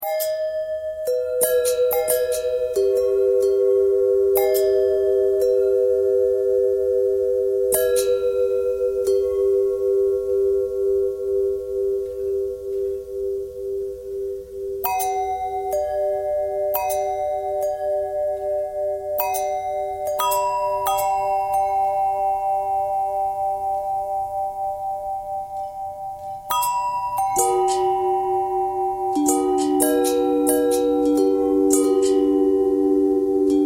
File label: long bell long bell bend threads, bells